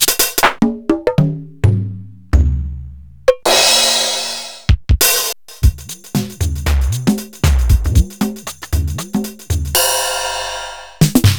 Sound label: Linn Linndrum